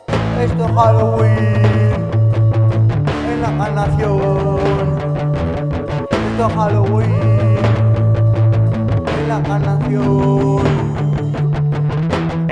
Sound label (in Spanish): CANCION HALLOWEEN